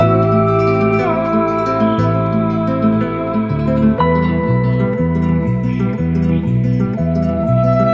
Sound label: guitar